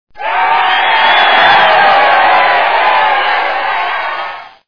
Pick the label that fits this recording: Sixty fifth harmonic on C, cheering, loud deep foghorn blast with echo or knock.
cheering